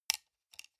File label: sunglasses plastic side piece fold